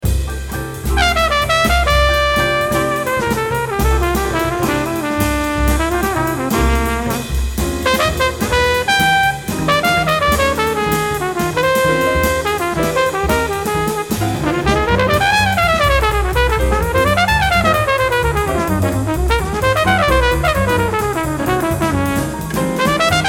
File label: Trumpet Solo